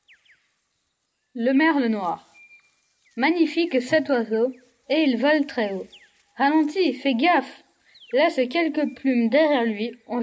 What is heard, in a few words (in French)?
Le Merle noir